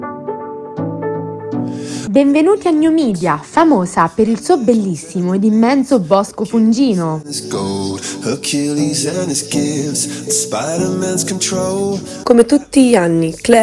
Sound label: Jingle; Incantesimo Gnomidia